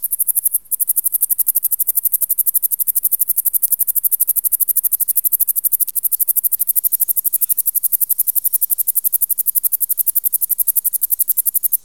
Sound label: sword-bearing conehead; Insects